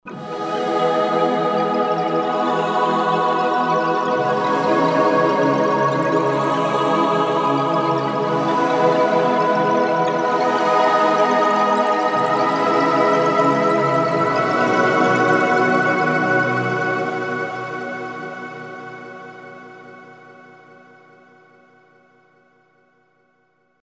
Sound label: korg m1r synth